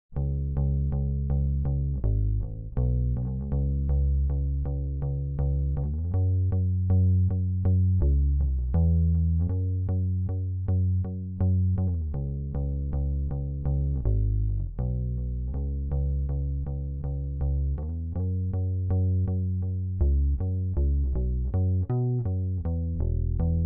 Bass DI

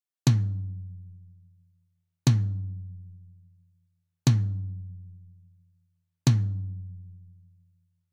Tom Bypass

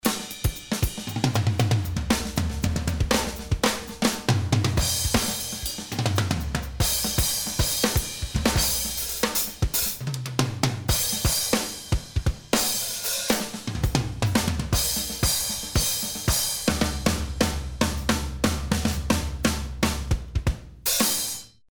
DRUMS LIGHT

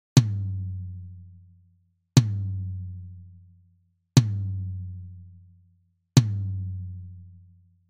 Tom

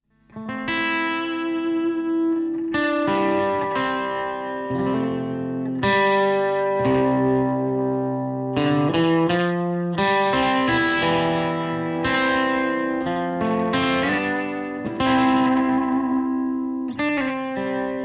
TWANG GTR EQ BYPASS

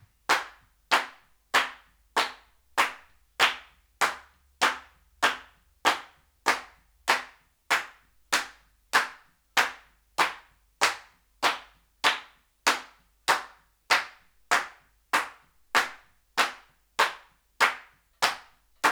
Handclap mix

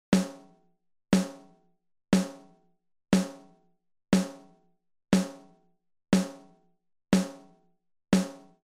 Snare Drum Bypass

